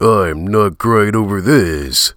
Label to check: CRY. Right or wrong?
Right